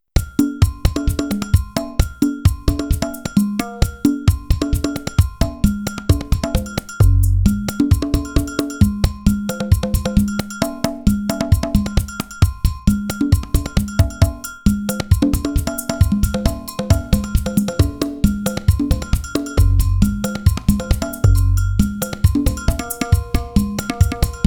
Drumming; Wu Lin Heroes

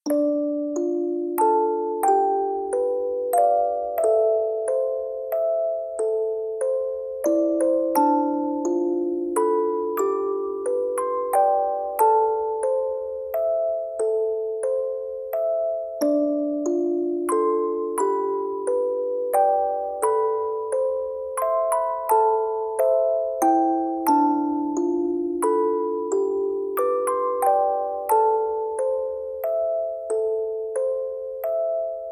lullaby; healers quest

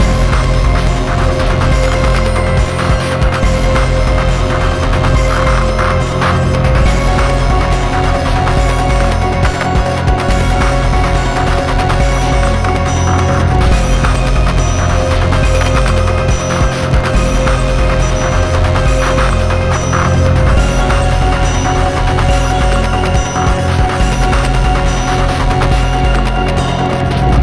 Glitch sonata Medium; rive wreck hack die retry; ps4

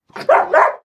Dog Goes Active; Prison Architect

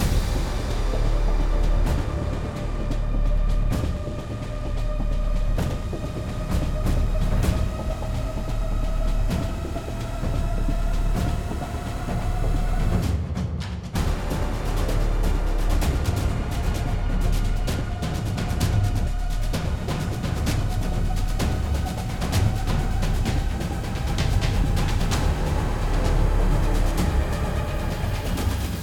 Mood Tension Heavy

